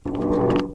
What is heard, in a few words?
warrior battlecry; Adventures in Lestoria; action RPG game; Sig Productions